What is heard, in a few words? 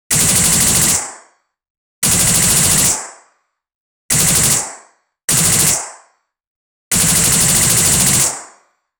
cyber gun single shoot, science fiction